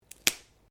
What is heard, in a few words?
Branches Dead Snap